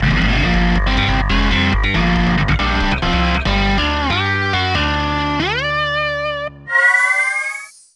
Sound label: Piccolo, Music that plays when using Piccolo; Bowser